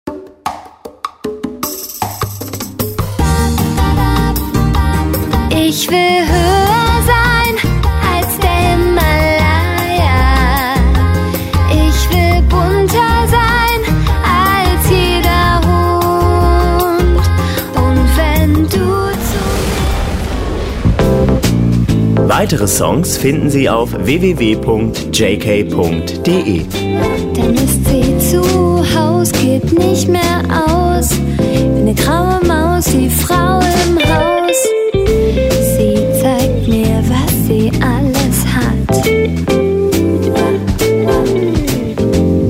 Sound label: sommer song